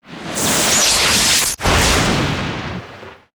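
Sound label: thunder spirit attack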